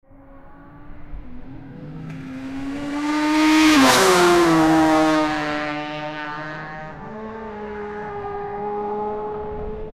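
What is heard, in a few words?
jaguar and hoarse high speed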